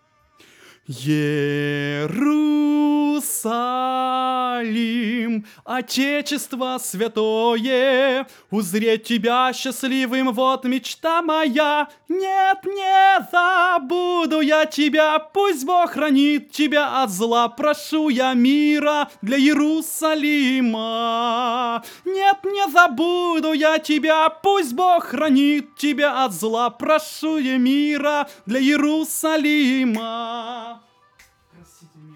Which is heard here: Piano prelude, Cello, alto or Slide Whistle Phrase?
alto